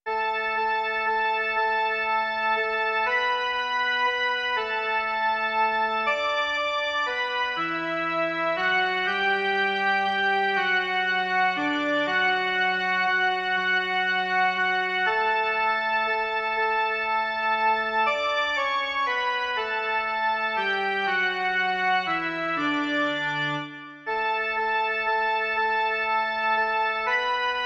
jen melodie

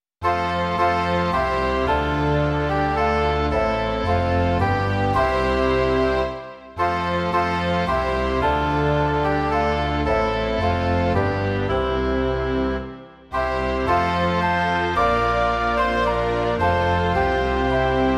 komorní orchestr; Hospodin sám národů Bůh; Imaginativní verze

